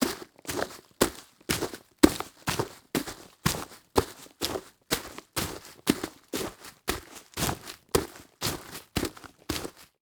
Foley Footstep Soft Sole Dress Shoes Leaves And Twigs Debris Fast Walking